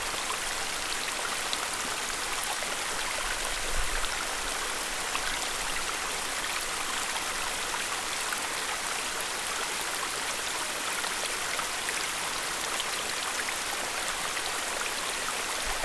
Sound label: Falls